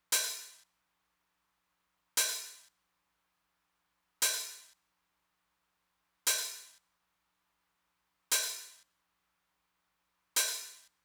open hat, Roland TR-909; Roland TR-909 Ableton Project

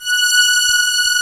COMBO STRINGS; OMI - Universe of Sounds

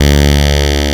PPG 2.3; DIGITAL1 HYB

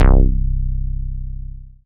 Single Electric Basses, Dance Floor Bass

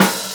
ohh; snes summer chip 2025

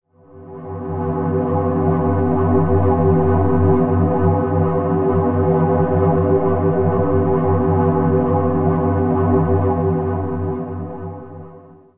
temple drone E, ancient drones; Zero-G Seismic Frequencies; 90 s Sample CDs

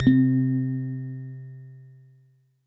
Synth Prophet One Shots, Keys C; Electronisounds

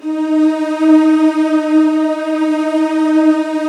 D#1, Virtuoso Strings

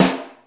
RY30 SNR; Hollywood Edge; The Big Bad Beatmachine

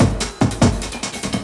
LOOP SD; Ueberschall; Drum & Bass Resonance; 145 BPM

